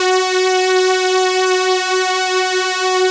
POLY SYNTH; Wizoo - Powered Wave

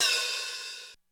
HiHatOp, Korg DSS-1

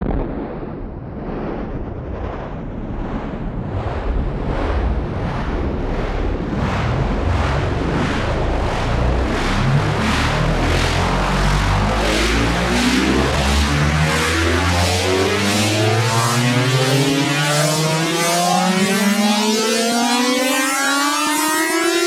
Rises; neuro; Optiv & BTK

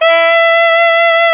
SAX ALTO FF, Alto Tube

